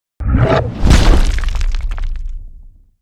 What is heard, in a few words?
Werewolf heavy landing impact